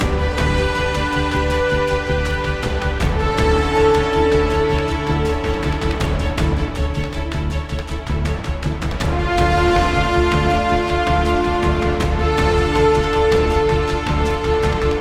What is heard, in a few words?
Mood High